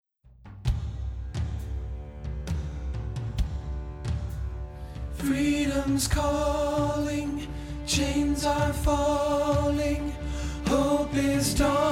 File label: bass rehearsal; choir